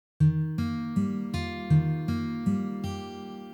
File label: Ornamentation Add a finger elsewhere